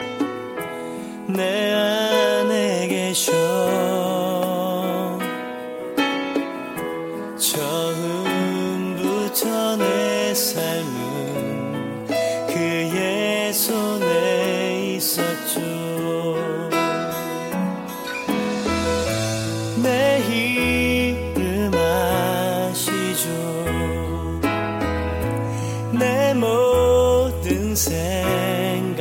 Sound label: korean